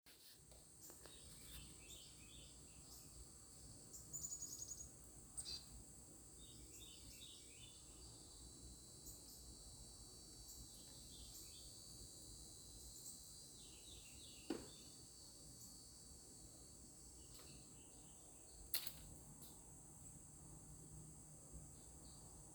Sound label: Corn Bunting, Emberiza calandra, Aves; Grauammer